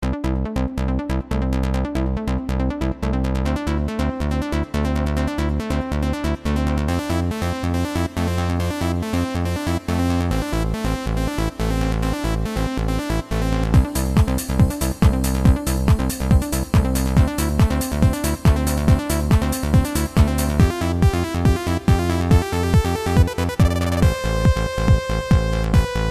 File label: drm, music